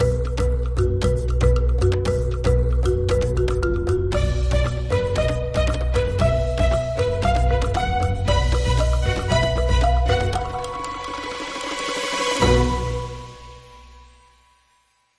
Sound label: Dramedy Sting